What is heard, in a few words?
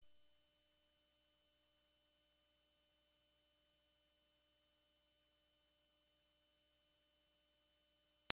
17 KHZ